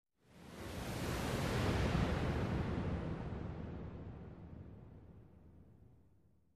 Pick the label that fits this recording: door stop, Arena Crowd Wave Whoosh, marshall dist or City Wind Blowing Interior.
Arena Crowd Wave Whoosh